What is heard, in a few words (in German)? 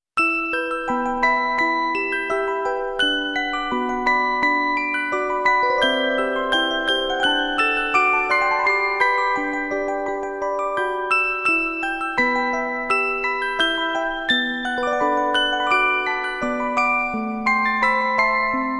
Orgel